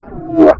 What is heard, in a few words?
gurp; quake2; gunner